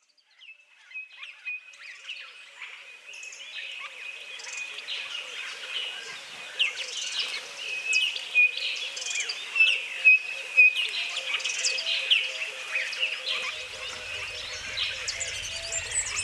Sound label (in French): le chant thérapeutique des oiseaux; coffret des sons guerisseurs